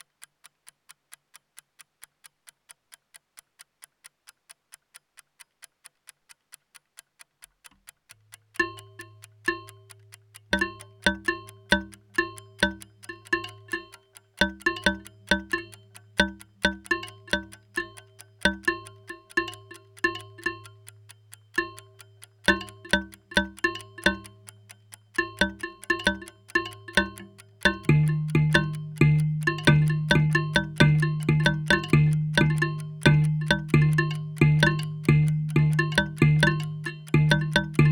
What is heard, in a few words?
vu perc, eurorack